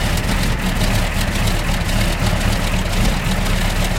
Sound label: blenheim side, engines; War Thunder